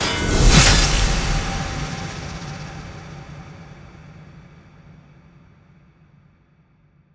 freespin intro; netent

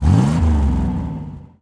car rev; netent

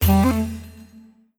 Button Spin; netent

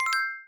Coin Count End

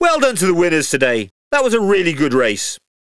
after Race; netent

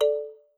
count Up End; netent

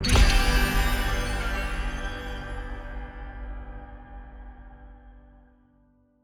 small Win; netent

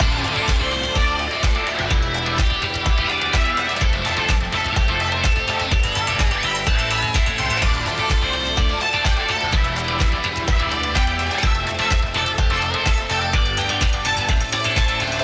Big Win Loop; fruitblaze

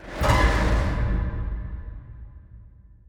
mega Win; netent

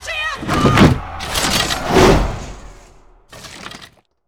shield wall land; netent

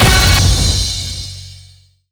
reel Arrow Up Down; netent

